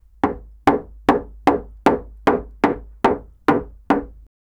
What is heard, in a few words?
the loud sound of hammeri, carpentry